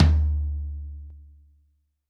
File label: Surdo Drumhead; Best Service; Festa Latina VOL-1; Single-Hits